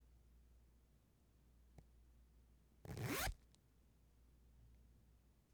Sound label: Zipper